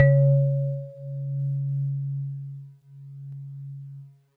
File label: GVibes DX100 Cs3; good vibes